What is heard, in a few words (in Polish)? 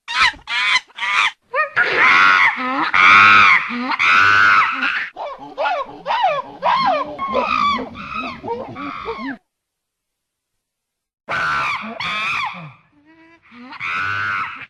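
Odgłos Małpka, Odgłos małpki; Odgłos małpki Małgosi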